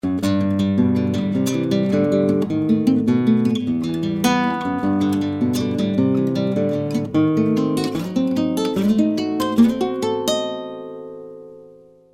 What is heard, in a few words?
Classical piece